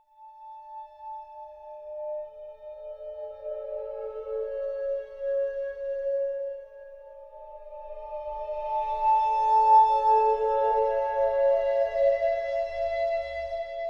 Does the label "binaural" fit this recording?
No